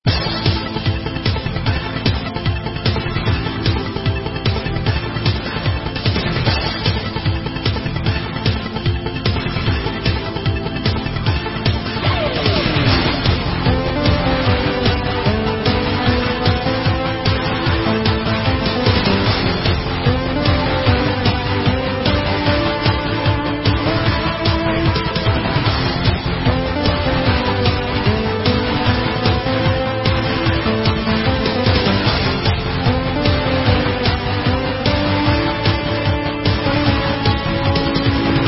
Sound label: bgm; LiveOrDie